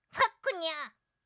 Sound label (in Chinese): 封鎖彈出式視窗